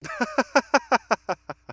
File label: happiness